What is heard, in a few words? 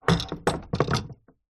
household table lamp metal antique down on table